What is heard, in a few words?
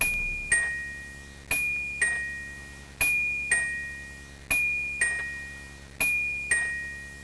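car door ring; vehicles cars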